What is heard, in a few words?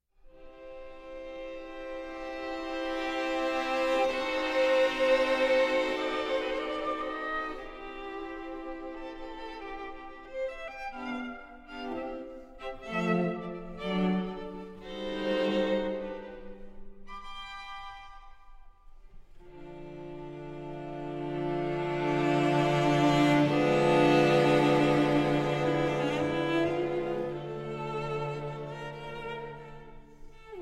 String Quintet in C Major, Allegro Ma Non Troppo